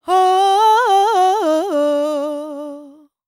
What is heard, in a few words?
World Voices, INDIA; ILIO; Vocal Planet